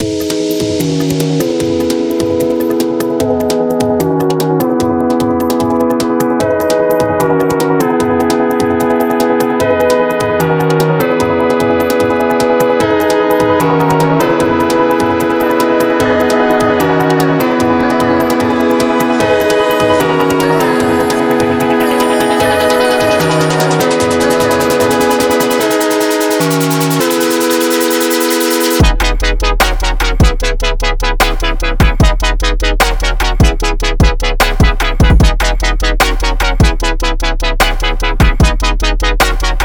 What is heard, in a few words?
LoFi Future Bass